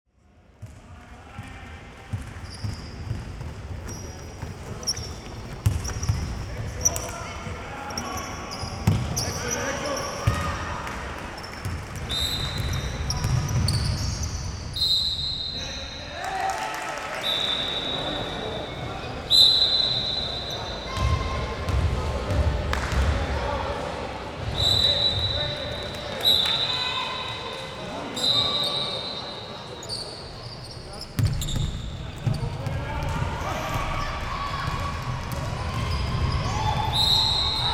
Referee Whistles - Game Ambience, Dribbles, Referee Whistle, Crowd, Applause, Basketball Game; Sound Ex Machina - Basketball Game Pro